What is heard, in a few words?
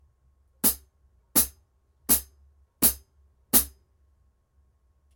15in Heavy HiHats, cymbal